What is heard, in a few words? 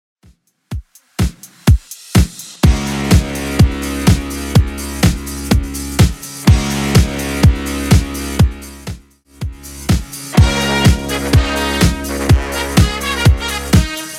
70s Redrum 125bpm